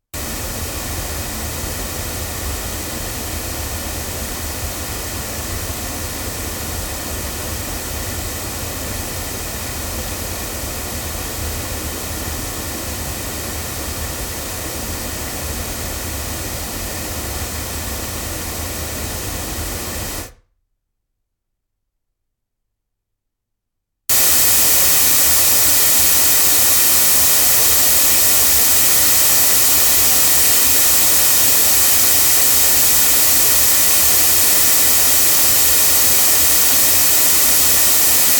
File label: loudspeakers, microphones recordings